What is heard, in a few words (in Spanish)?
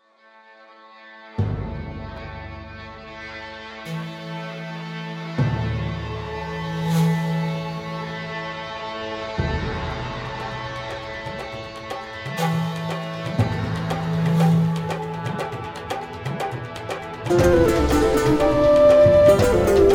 jingle; ASPA